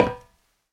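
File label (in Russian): точный короткий слабый удар по металлу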